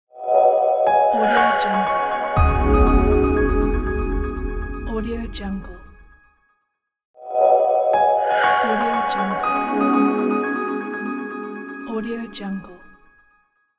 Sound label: quick 3d logo; kirillluzin